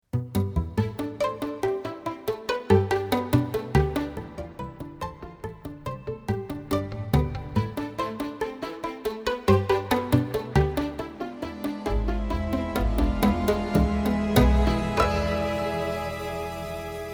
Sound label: plucked bowed